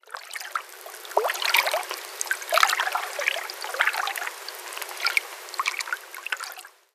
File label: ambience canals water lap